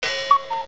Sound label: WRONG